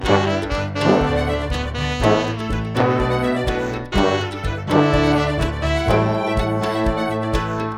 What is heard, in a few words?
selection acoustic